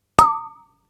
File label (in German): Wasserflasche